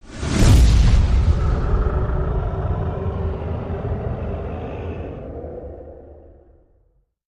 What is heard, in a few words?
Hit Swo Descend